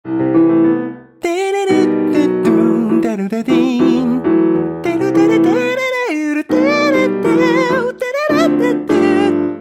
mute piano eq

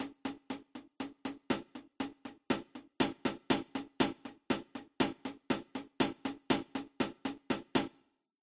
one hihat

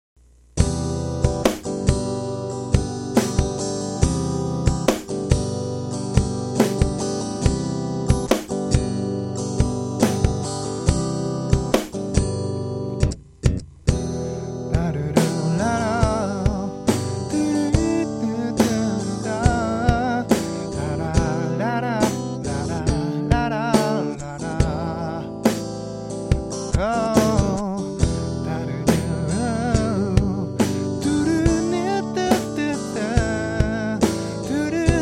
drum

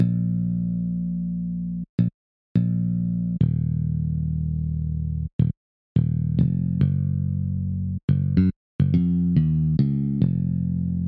bass

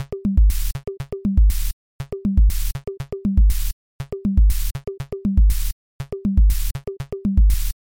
drum

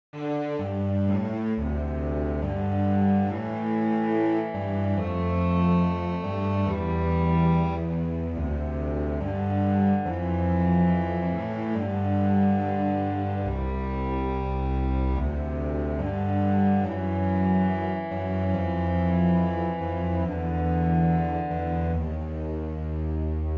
cello

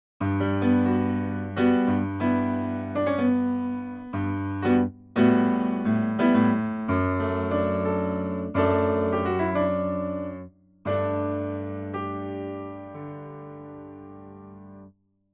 neo piano

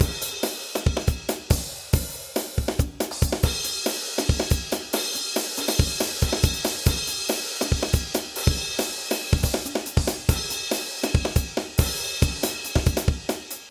drum

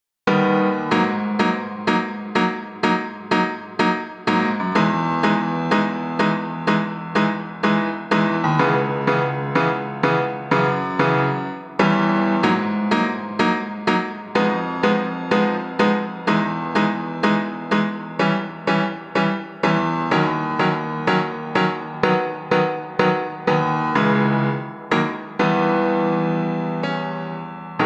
piano dry

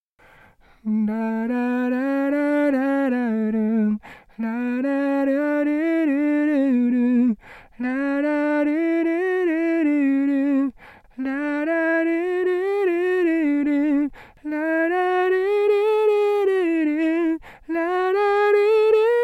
vocal

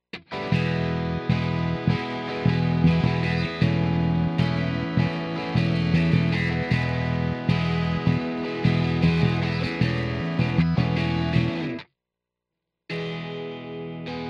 no bass

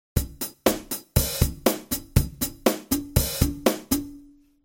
8beat

